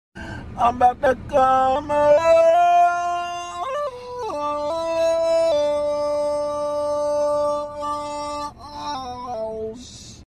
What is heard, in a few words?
autotune; ambatukam